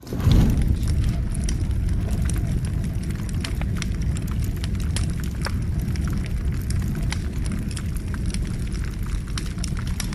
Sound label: Large Fire Lighting; SoundsCrate